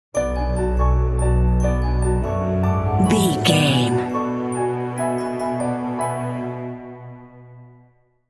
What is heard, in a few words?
beauty unknown stinger